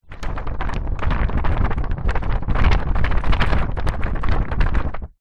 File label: paper sheets x3 shake wobble close up; foley